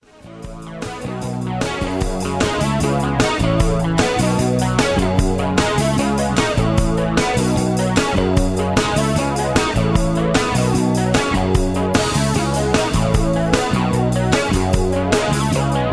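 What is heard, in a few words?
Karaoke Backing Track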